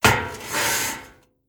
Metal Turn

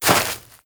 Grass Walk; moul-assets

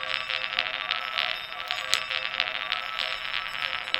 Marble Stn Roll Lp; moul

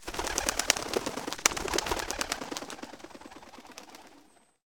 Bird Flaps; moul

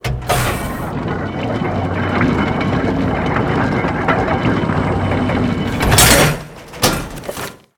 Book Retrieval; moul-assets

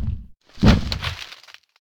Walk to Idle; Urwin

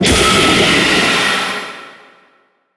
Fumerol Blast

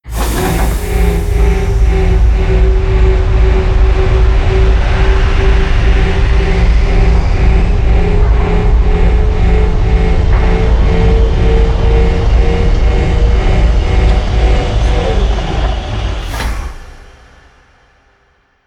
Platform Up

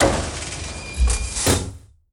Work Room Elevator Locks; moul

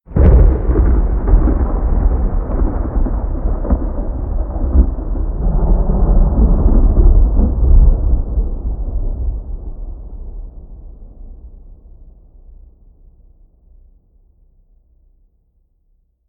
Sphere Thunder

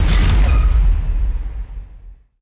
Pillar Stop

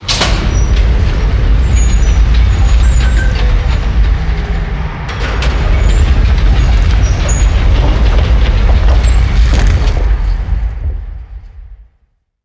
Pillar Reset Dry; moul

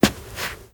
Rug Turn

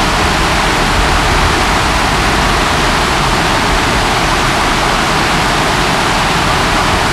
Waterfall Medium Loop

